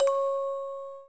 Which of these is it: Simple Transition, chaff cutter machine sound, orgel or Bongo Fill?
orgel